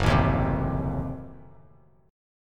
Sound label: Gb Diminished 7th Gb down Bass Standard; strummed; JGuitar